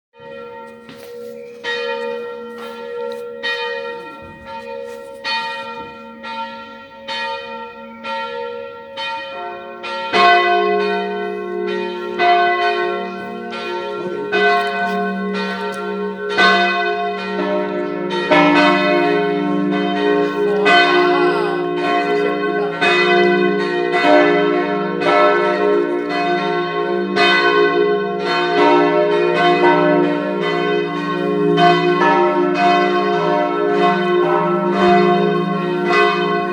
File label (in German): Glockenklang Gräfenberg; evangelisch